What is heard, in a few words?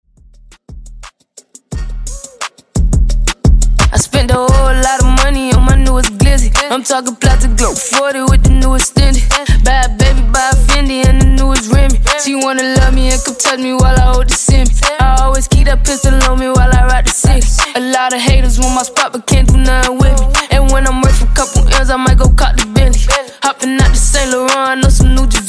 Dj Intro Outro 87Bpm; D Swervo